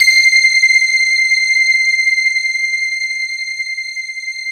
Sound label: JV HUFF N ST; Syntec; Wall of Sounds